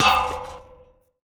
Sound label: Street Ball Metal Pole Hit; SFX Kit